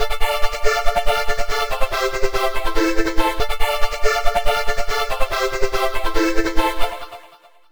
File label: Sol Supersaw; one of those typical uses of supersaw that I've heard in EuroPop music; Solaris Supersaw #3; Lastly here's a simple string patch using only 1 oscillator with the Supersaw waveshape. There is no modulation of pitch or shape.